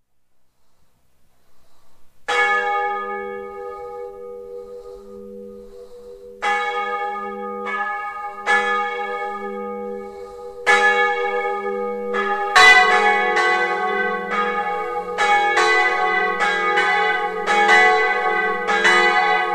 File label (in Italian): campane; tramonti di sotto